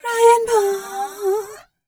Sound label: f mel c, fem Vocals